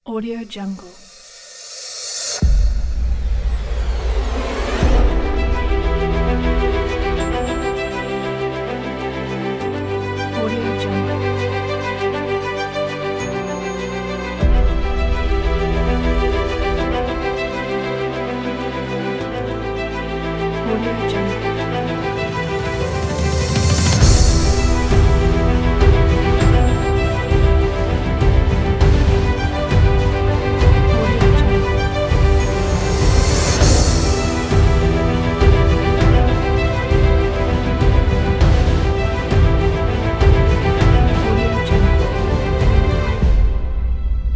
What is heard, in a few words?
sad background pack